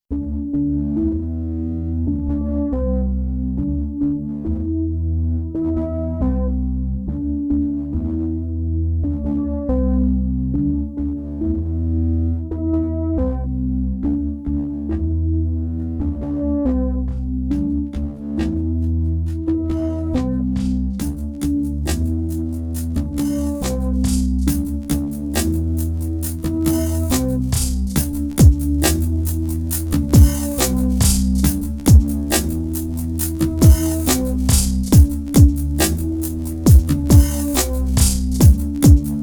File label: dance